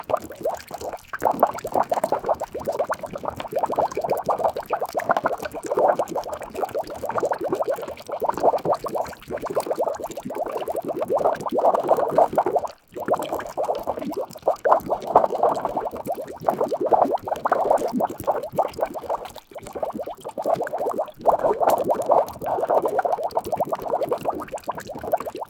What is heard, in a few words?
female gurgling with bubb; blubbering